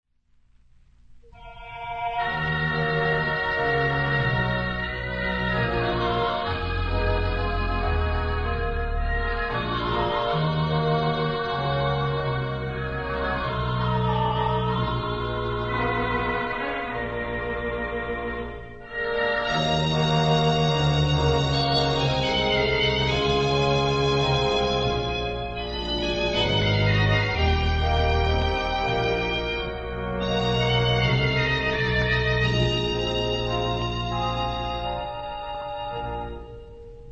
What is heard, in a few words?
pop organ, theatre organ; allen